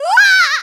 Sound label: Burn; Link getting burned